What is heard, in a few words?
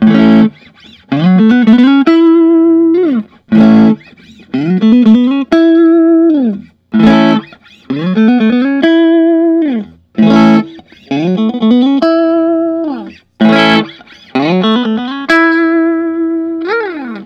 fender usa stratocaster pre cbs